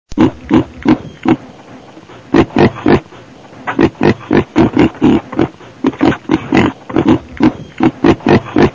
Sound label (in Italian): CINGHIALE GRUPPO